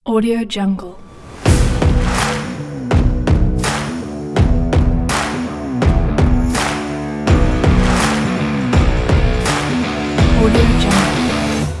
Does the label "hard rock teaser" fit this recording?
Yes